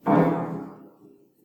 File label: piano